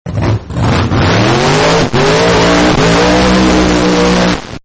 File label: Hot Rod Takes Off, car; Vehicles; Partners In Rhyme